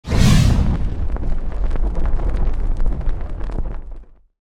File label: Fire magic spell firewall; fantasy